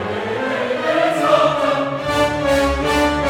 Chorus & Alto Solo; INTERLUDIUM - Scena

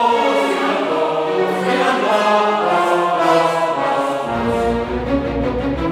Chorus & Alto Solo; INTERLUDIUM - Scena

